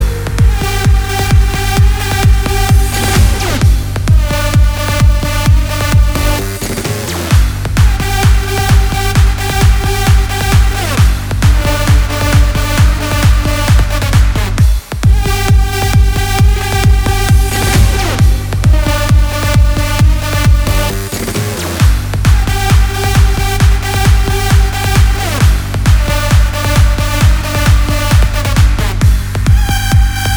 רינגטון

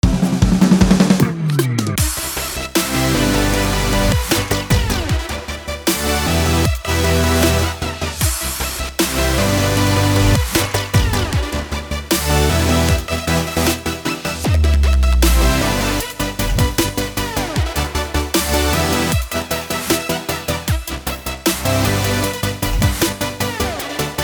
לפני eq; על כל שכבה ושכבה בטראק שלכם שימו EQ שמוריד את התדרים שלא צריכים; טיפים מהסטודיו 3 אקולייזר eq ומיקס בסיסי

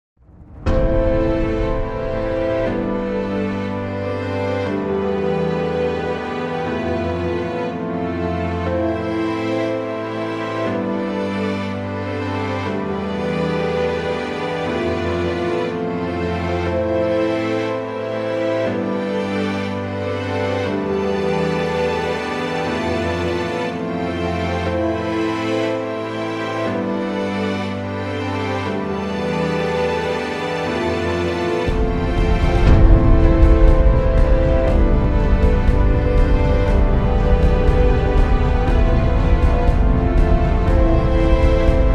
מוזיקה קולנועית

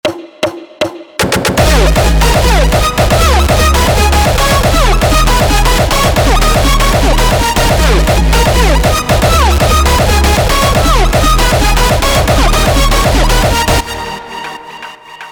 מלודיק הארדסטייל

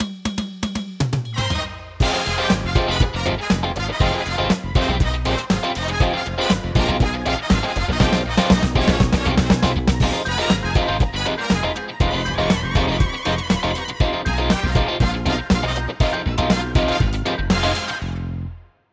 פאנק

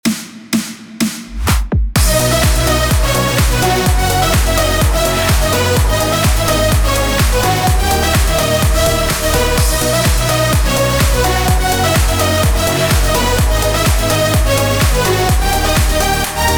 פרוגרסיב האוס

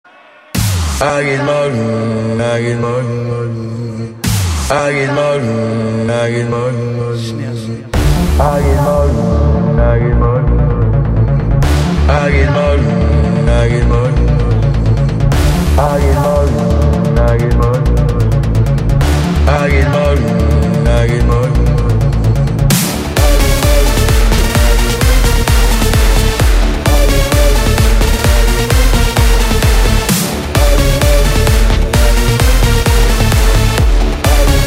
רינגטון

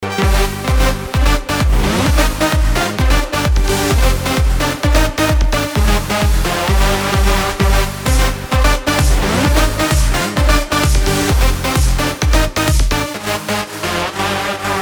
רינגטון

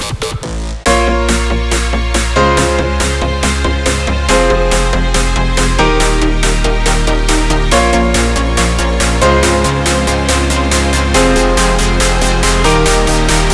צילצול

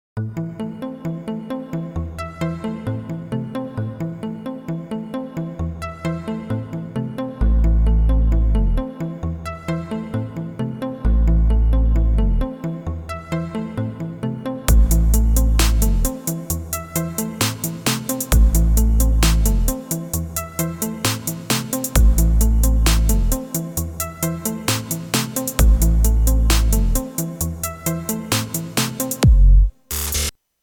פתיח מחרוזת

